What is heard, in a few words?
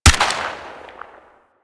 Dex Furis Shot C; Tenno